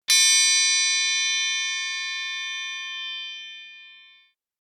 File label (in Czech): triangl